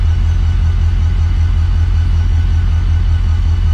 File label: EMD idle; finnish